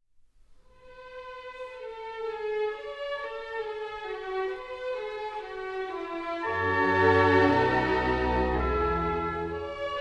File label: Violin Concerto No. 5 in A Major; Mozart